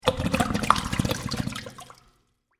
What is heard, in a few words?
Water Gurgle Down Pipe; Kitchen; Household